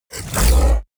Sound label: BEAST Threaten Short, Monsters; SmartSoundFX